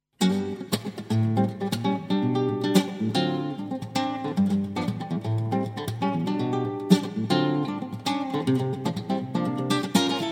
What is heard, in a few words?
blues